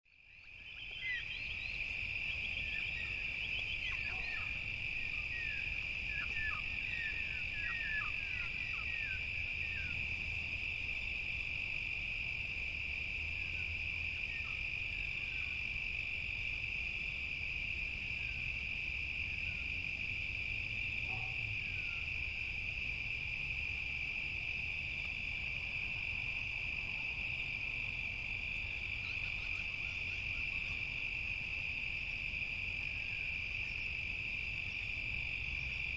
Summer evening calls sampled and treated vinyl crickets